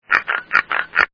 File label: croak; nova; sciencenow